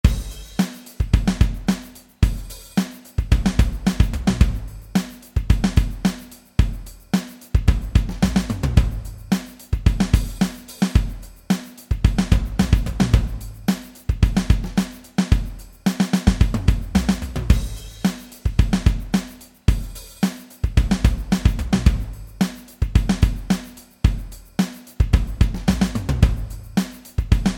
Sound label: drums